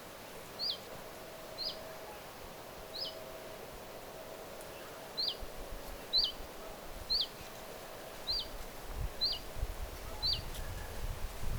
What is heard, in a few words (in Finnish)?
viyvif tiltaltti lintutornin vierella